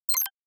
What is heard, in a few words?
Digital Click